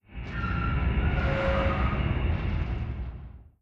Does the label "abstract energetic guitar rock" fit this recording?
No